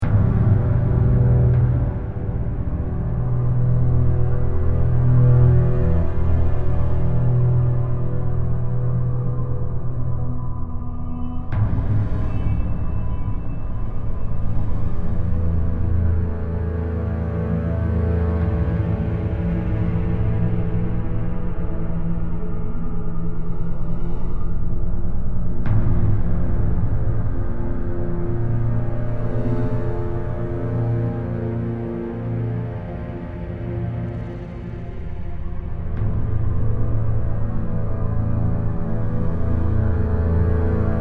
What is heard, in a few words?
Catacombs Ambient